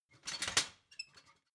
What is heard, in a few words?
ladder metal handling